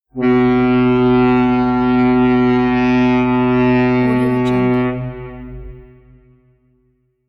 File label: steamboat whistle